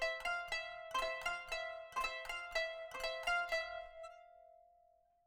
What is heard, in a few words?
kanun